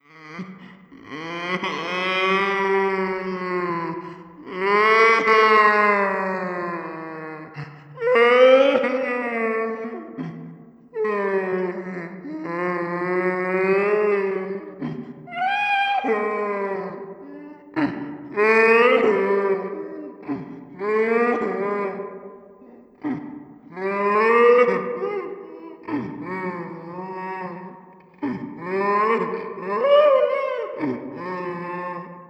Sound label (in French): monstre pleurs; Feed The Beast; Monster